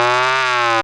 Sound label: syn, Dance Synths, Wasps; ProSamples vol.37